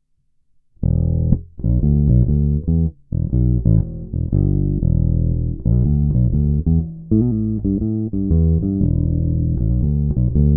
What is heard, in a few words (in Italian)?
pba 54 dita tono chiuso